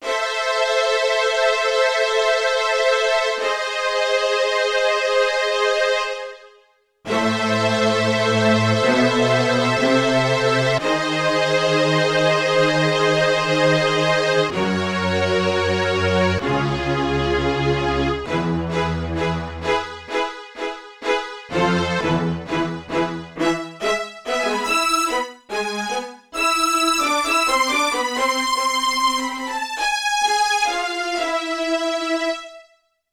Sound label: Violin-Orchestra; Yamaha TX16W